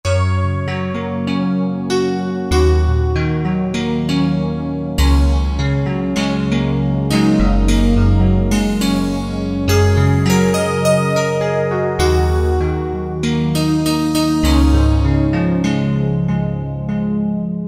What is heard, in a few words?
Whispery Piano, Roland JD-990; Factory Patches